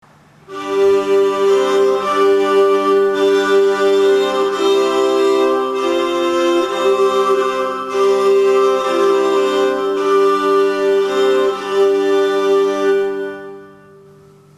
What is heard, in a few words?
crwth